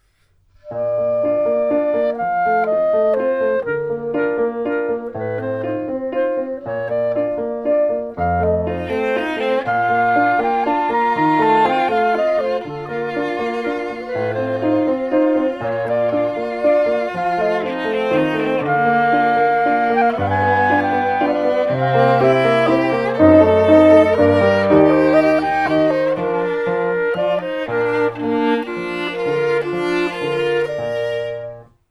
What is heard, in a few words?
backing